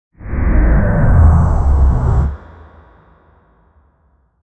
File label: slow robot walk massive